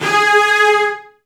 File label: Virtuoso Strings, TRM FX; ILIO; Kirk Hunter Virtuoso Strings VOL-2; 10CL TRM FX